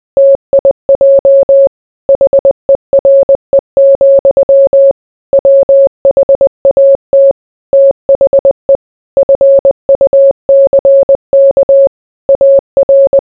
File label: morse